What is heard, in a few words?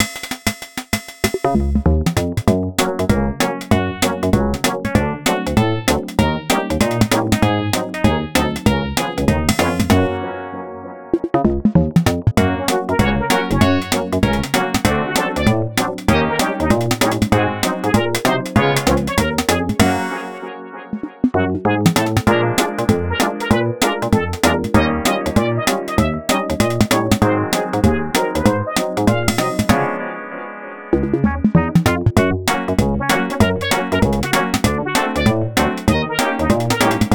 Happy Modulation